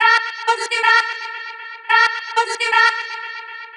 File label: chopped vocals ping pong house delayed